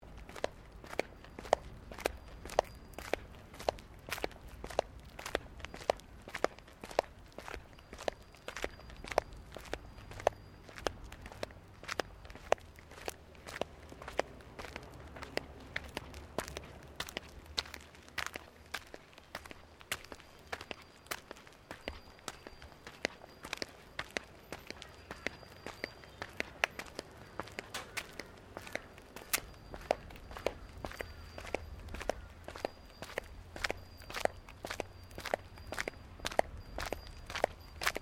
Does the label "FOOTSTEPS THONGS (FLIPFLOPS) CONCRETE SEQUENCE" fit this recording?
Yes